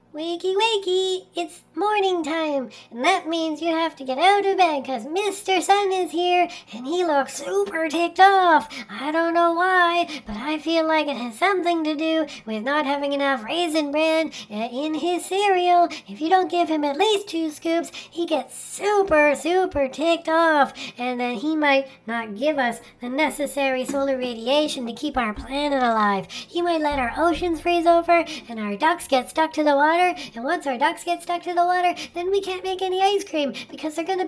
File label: alarm